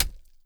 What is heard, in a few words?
Flesh impact